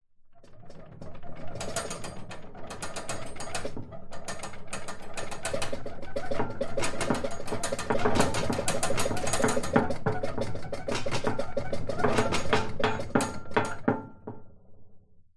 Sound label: household shelving rack loose objects metal shake rattle wobble; zapsplat